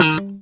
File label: eb4 staccato, glowly bass